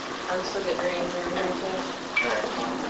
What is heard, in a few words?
ding and no response; Sachs Bridge Evidence; Spirit Chasers of the Unknown